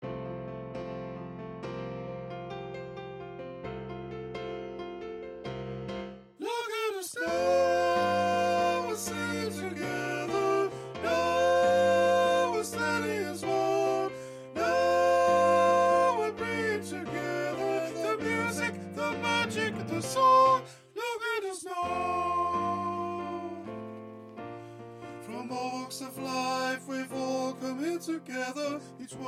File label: look at us now soprano 1, ladies choir